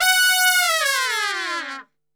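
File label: F#4, Brass Super Section, trumpet; Best Service; TRP LNGFLL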